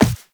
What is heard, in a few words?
Walk Default, Footsteps; Warframe; Characters; Operator